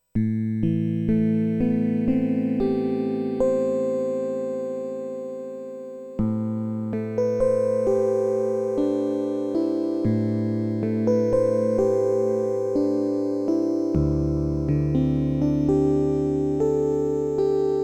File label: filter fm